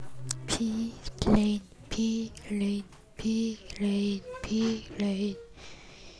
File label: rain